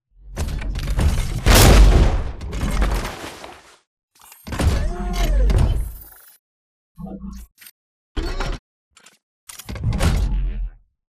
Dish Slide Catch; Beacon; BT; Titanfall 2

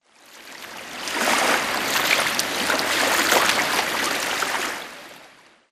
swampland water lapping; Titanfall 2

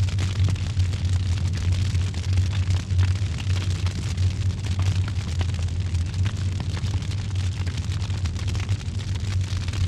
fire loop big crackle; colony; Titanfall 2

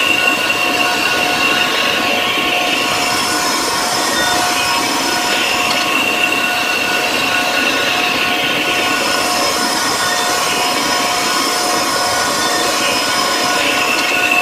Giant Pillar Pods Active Hum; Titanfall 2; Boomtown

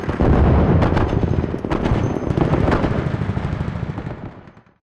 scripted distant fog battle; Titanfall 2

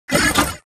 Mvmt Servo Walk; AI Stalker; Titanfall 2

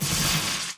bullet energy titan arc; Titanfall 2

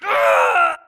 grunt death crush; Titanfall 2

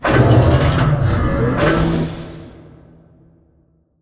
Robot Arm Right Deliver Retract Close; Boomtown; Titanfall 2

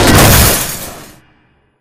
Battery Crate Explo Metal Crack; Titanfall 2

